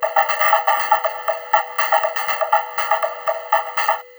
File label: RYTHM 120, Drum Loops Crazy Processed; USB Soundscan vol.07; AKAI